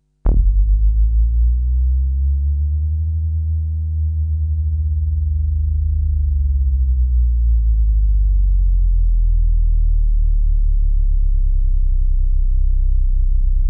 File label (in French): arpegio irreg; 1.20min; Ondulations sinusoidale aléatoire